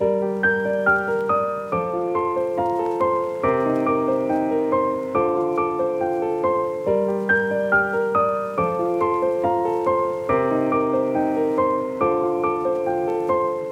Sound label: Piano Loop - 140 BPM G Min; Instruments; Cymatics; Light Free Sample Pack; Bonus Samples